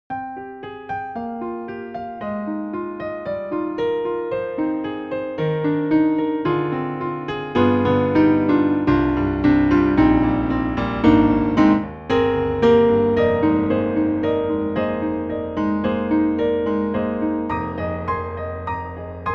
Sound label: Sweet Grand; Italian Grand; Synthogy; Ivory II; Sweet Tshift Grand